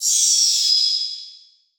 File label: Title Transition Electrical Power Down; Adobe; Production Element